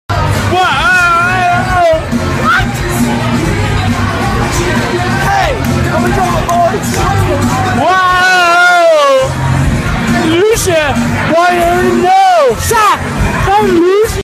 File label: waaahahaaayooohh